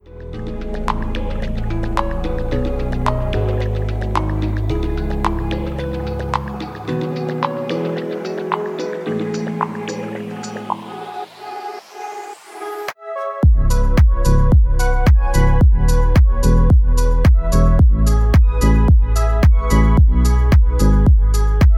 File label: Soft Bargrooves